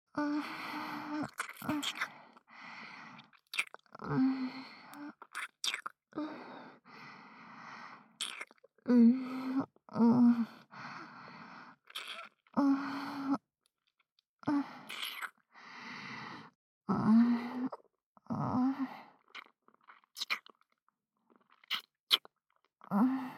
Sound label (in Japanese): ベロチューループトラック, ASMR; 光莉; 開始数分即ハメボイス～可愛い後輩は酔わせてお持ち帰り→即生ハメしちゃう先輩に捕まって逃げられない!